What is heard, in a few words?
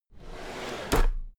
drawer cabinet equipment open regular